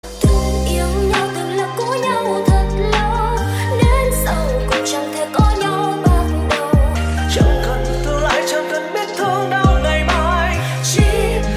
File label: lofi